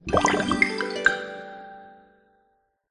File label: Breeding Complete